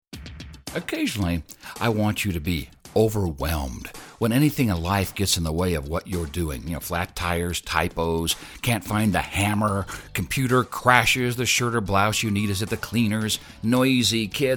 music

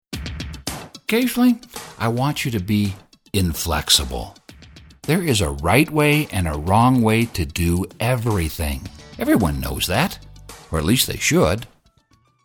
music

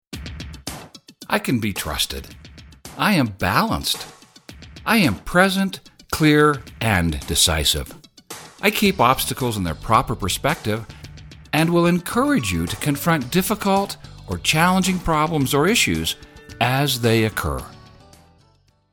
music; Proactive balanced

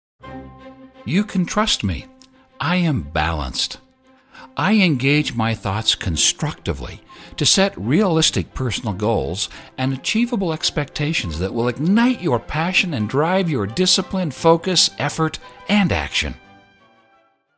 goal oriented balanced music

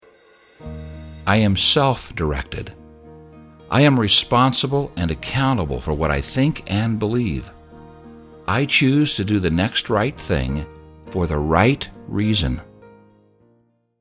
Self directed music

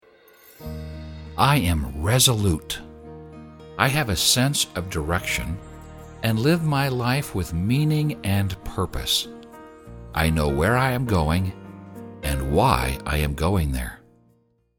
Resolute music

